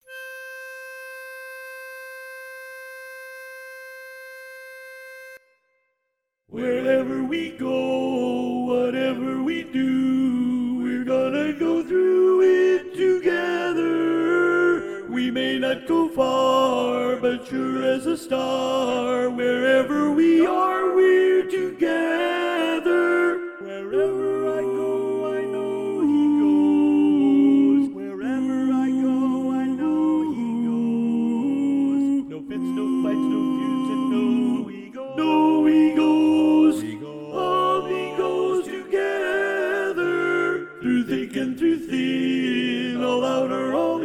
Tenor

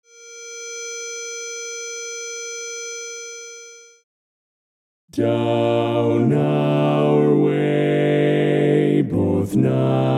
Bass, Learning Tracks, Barberpole Cat

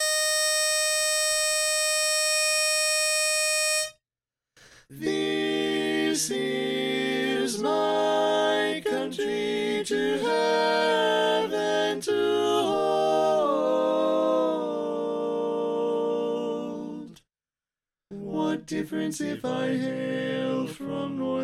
Tenor Left

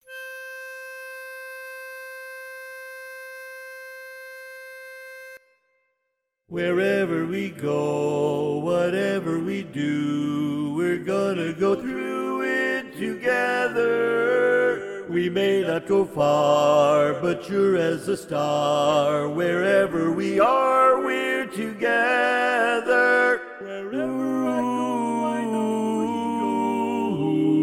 Baritone

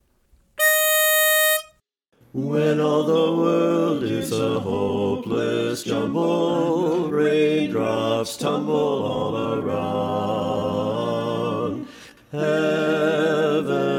Bass louder

